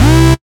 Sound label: Basses Root C